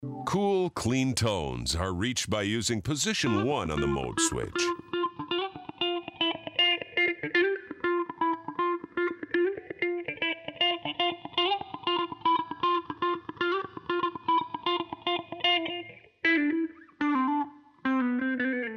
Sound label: Cool Clean Leads